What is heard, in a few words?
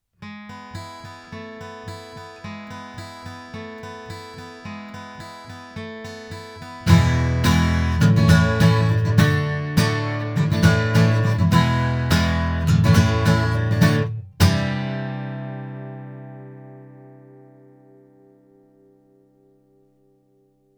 CR-14 guitar; Avant